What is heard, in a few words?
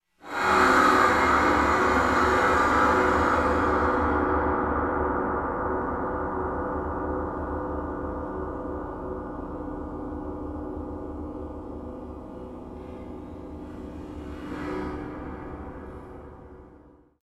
scary